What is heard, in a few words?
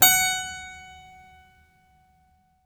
HARPSI F#5; HPSCHD 4